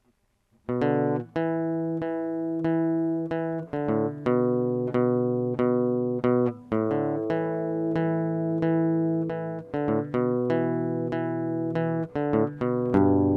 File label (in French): musique, waves